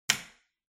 Light Switch Rocker Hall Hard

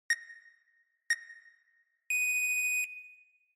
Countdown

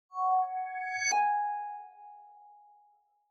Smartphone UI Sound Dry

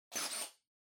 Blowout Far

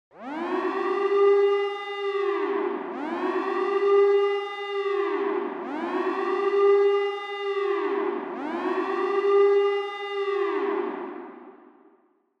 Warning Siren Reverb Slow Long

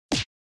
SNES Fighting Defend

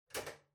Ceiling Light (Far Pull)

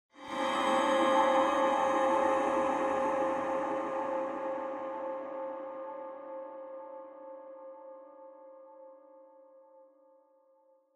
Horror Accent, High Long

